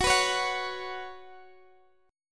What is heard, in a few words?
Gb Suspended 4th, Guitar, Open G; x,x,16,16,14,16; down; chord shape